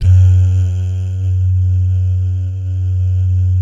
"DAH", Vocal Jazz Fx

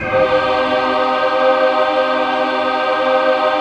VOICE PAD; Best Service; XX Large; Pads

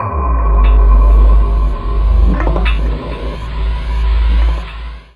SWIRL

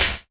HIHAT; E-Lab; X Static Goldmine 2

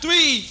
VOC

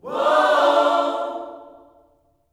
WHOA OHS, Gospel, Vocal; Spectrasonics; Vocal Planet Vol.1 Gospel

